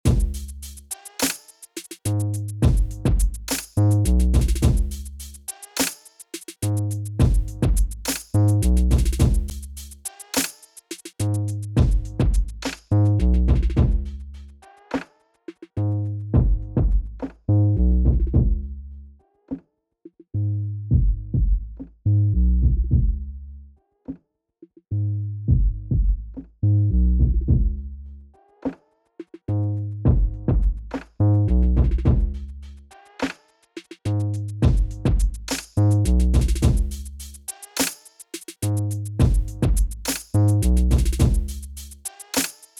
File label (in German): harrison 32cpre plus vorverstaerker api500 loop filter